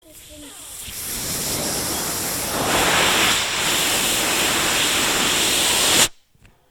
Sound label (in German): starker wind